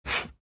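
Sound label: sounds of playing checkers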